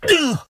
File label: Scream